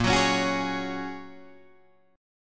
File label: Bb Minor 7th Sharp 5th, Guitar, DADGAD